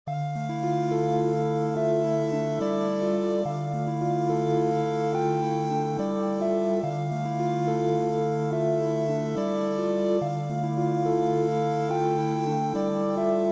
F min 142 BPM Pad Synth

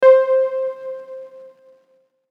Pluck One Shot

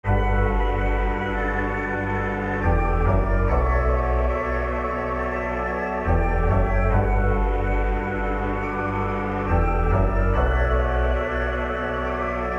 Bmin 140 BPM

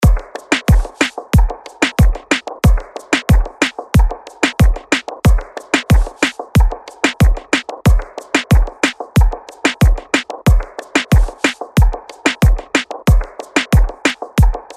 Latin Melody Loop Dmin 92 BPM Full Drums

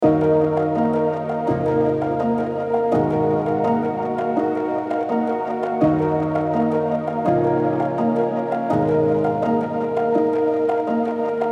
D min 166 BPM Piano Keys

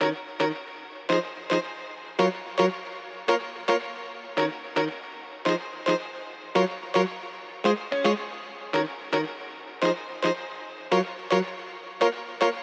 Afro Melody Loop Amin 110 BPM Guitar

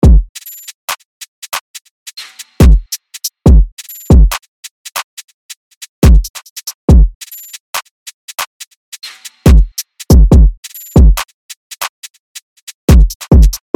Drill Drum Loop 140 BPM

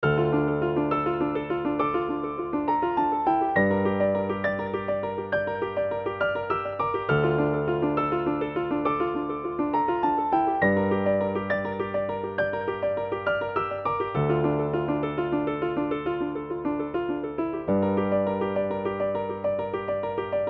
Piano Loop Dmin 136 BPM

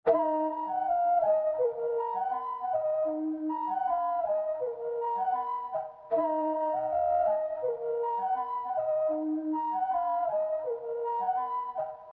Melody Loop Dmin 159 BPM Flute

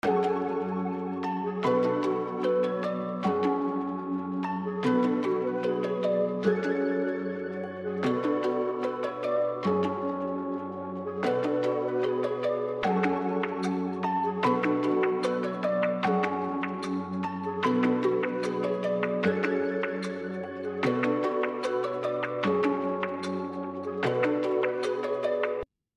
Melody Loop F 150 BPM

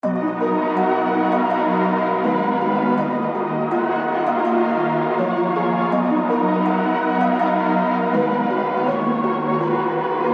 D min 163 BPM Vocals Plucks

